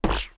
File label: hit, damage; minecraft; TBMPack